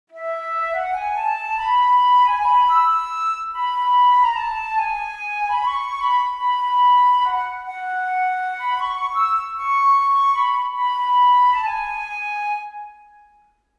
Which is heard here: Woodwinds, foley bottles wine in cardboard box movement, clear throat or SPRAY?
Woodwinds